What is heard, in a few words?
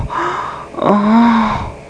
pleasure, People; Easy Personal Web Page